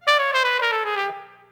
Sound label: Trumpet Missed note; The Princess and the Frog; wii windows; Hub Diner; Light Buford